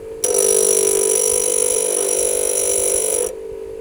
Grinder; INDUSTRIAL

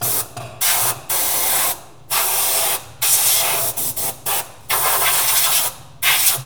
Air blower; INDUSTRIAL

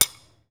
Hammering, INDUSTRIAL

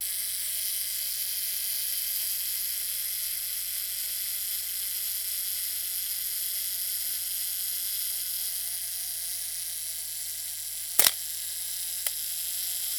Camera

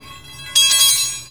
Drop, INDUSTRIAL

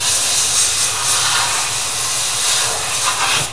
Air blower; INDUSTRIAL

